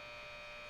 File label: Loop, Train; OpenBVE